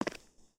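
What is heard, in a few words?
Foot Concrete Sneaker Walk, footsteps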